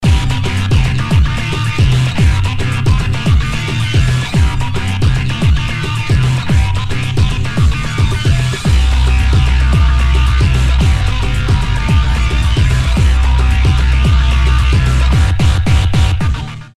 mumbai rock

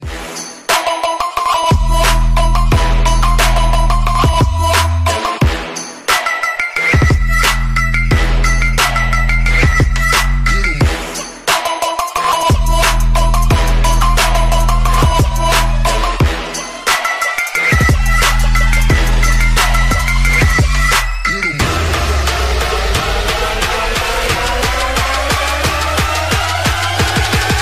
Trap Remix

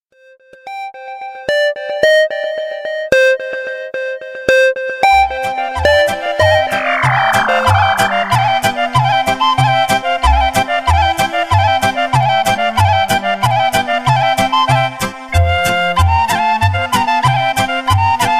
serbian ring, ringtones

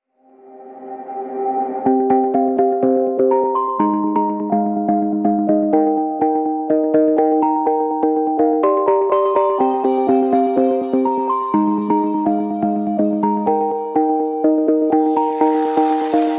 Piano

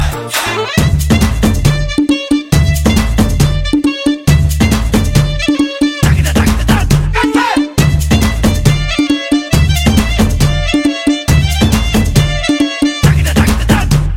caller tone; ringtones; holiday

